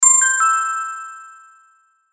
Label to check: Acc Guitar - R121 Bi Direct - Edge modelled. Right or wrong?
Wrong